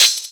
Cymbals HH Open; Vengeance Samples

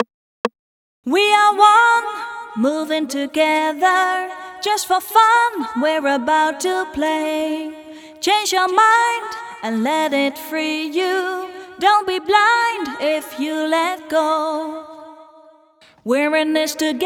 Verse + Bridge FX, 134 BPM; Vengeance Samples; One

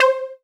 Synth Sound; Vengeance Samples; VEE Synth Shots

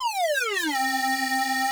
Fx

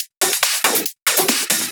Loop 140 BPM; vengeance essential club sounds-3

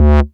Bass Multi Rev D; Vengeance Samples

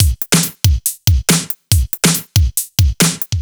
Breakbeat 140 BPM; Loops; vengeance essential club sounds-3; VEC3 Breakbeats

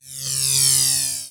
Sweeps Medium

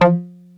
Arp B F3, ARP Odyssey; synth; musicradar-synth-samples

